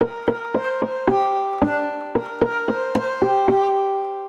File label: Handmade Zither Melody C Minor 112 bpm; MIDI Clips; Tonal; Ableton; Factory Packs; Lost and Found